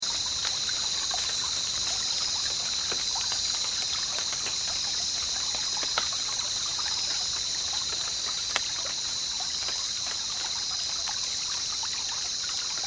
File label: rainforest sounds of the wilderness jungle river